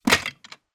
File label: toy mega block structure throw in box of loose blocks; zapsplat